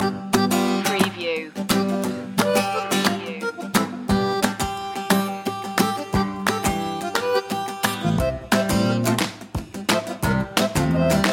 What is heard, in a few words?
creole loop